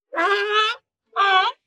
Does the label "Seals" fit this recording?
Yes